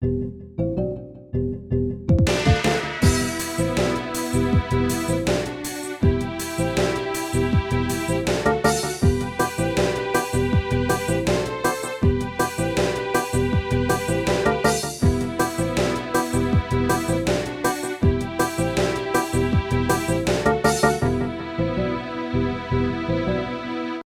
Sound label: SHOP LOOP Antique Collector 80bpm; SAGA Complete Collection; SAGA V - Towns, Shops, & Mini-Games